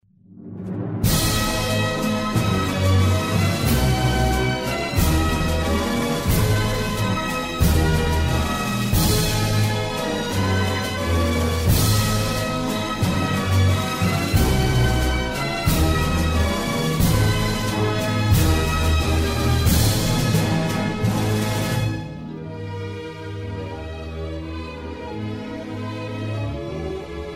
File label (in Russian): gimn ukrainy instrumentaln